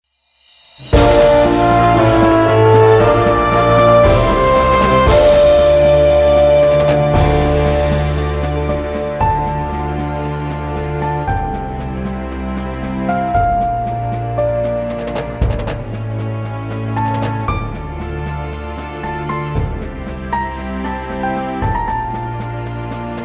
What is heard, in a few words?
Victory Fanfare; The Last Federation